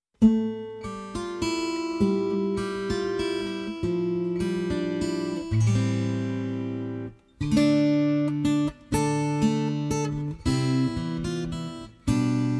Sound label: Scarborough Fair traditional